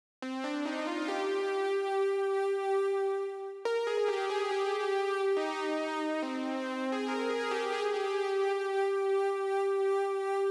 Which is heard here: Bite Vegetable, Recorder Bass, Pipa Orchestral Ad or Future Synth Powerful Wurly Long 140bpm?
Future Synth Powerful Wurly Long 140bpm